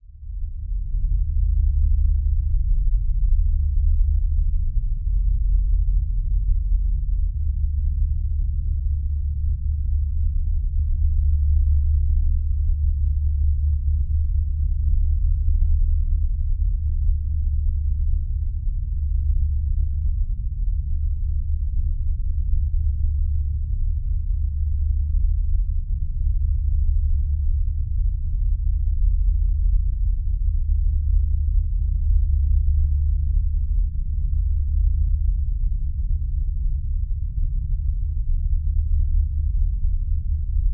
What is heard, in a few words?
Drone Deep Subsonic Rumble Bass; Production Element Imaging; AdobeCC